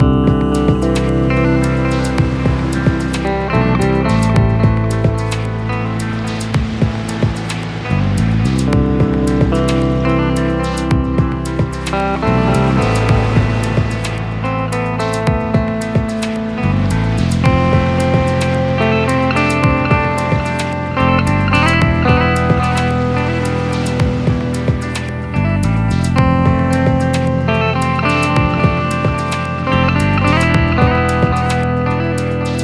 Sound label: Chill Relaxing Atmosphere